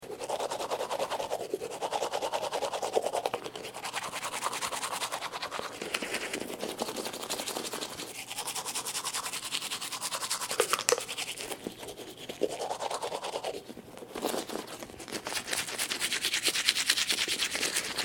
Brushing Teeth Toothbrush Cleaning With Lots Of Foam; Brushing Sound Effects